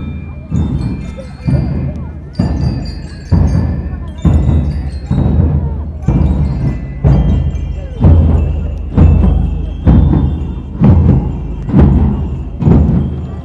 marimbas del desfile confraternidad fiestas de quito

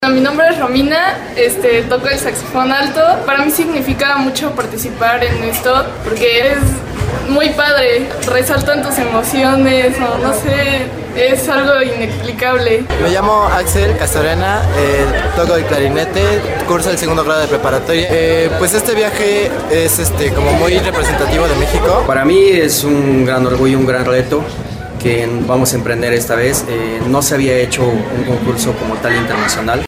marching band; guiluchos; xvii festival internacional de bandas de musica

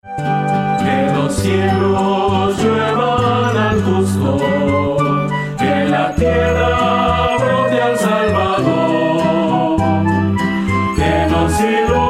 canto de adviento

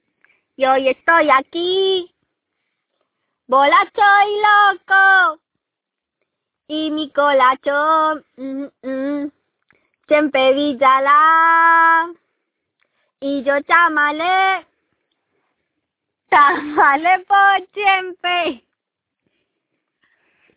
cantando

